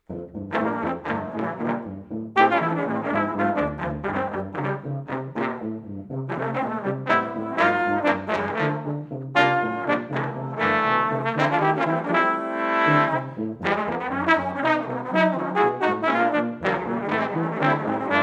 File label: 240 bpm